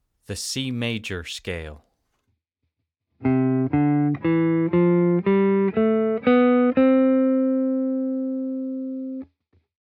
Major Scale

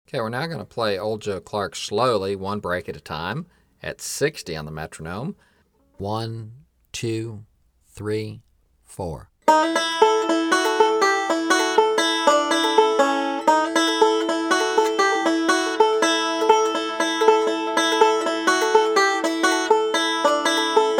Old Joe Clark Break 2, 60 Bpm

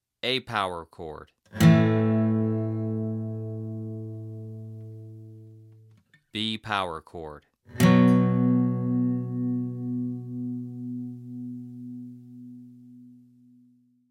Power Chords On The 6th String

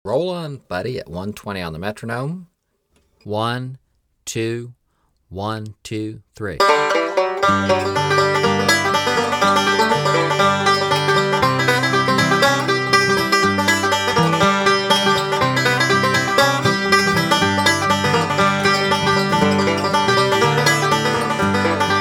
120 Bpm

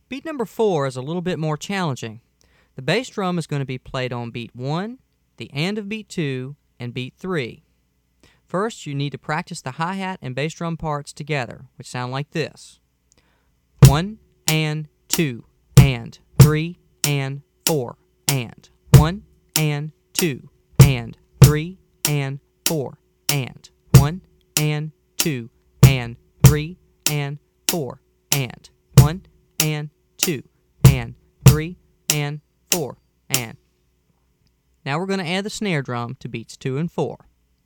Beat 3

